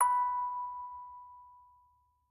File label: orgel